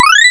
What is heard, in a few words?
Bruuup; TinyTown